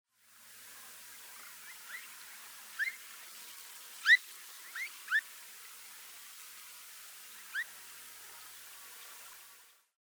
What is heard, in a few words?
Lark Bunting Calamospiza melanocorys; sparrows